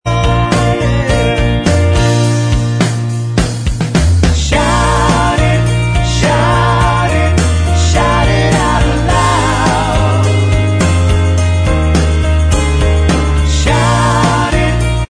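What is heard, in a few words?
backing vocals track, backing tracks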